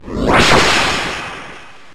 Trop Kick; pokemon reborn e19 2022

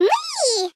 Candypet Cute Dragon Happy; candy crush saga gamerip

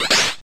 Karate Chop; PRSFX- Karate Chop

